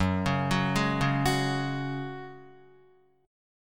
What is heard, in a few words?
Gb Suspended 2nd, Guitar 4E,4A,5D,5G,5C,6F; Gbsus2 arpeggiated